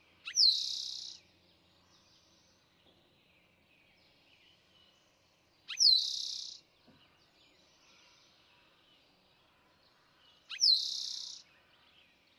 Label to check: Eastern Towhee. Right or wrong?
Right